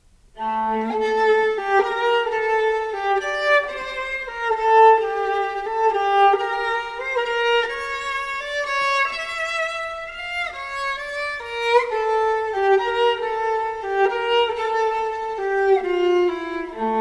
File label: Brahms Quartet No3, Bratsche